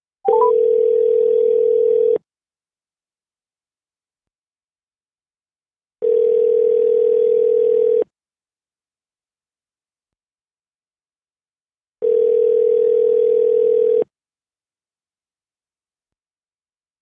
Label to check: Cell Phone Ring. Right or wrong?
Right